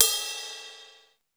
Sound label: RIDE, Classic drum machines; SR16; musicradar-synth-samples; SR16CYMB